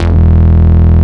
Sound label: Root E, Bass